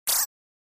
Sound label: Pop Up HF Feedback Buz, pop up element